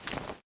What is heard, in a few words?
movement cloth